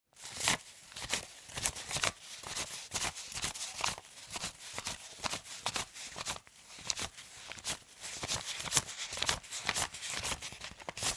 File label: counting money